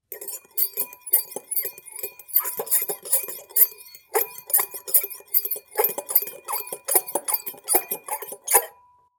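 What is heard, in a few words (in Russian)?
звук вилки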